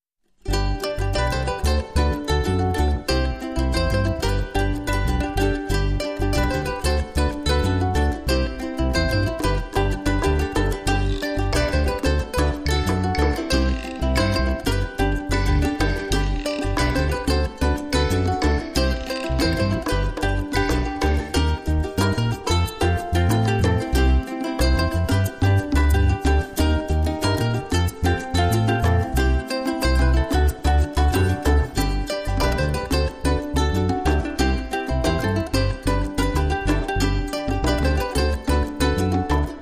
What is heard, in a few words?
Ukulele